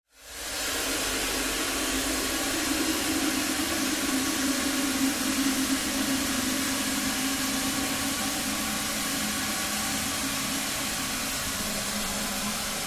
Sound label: Water Pipe Industrial; pipes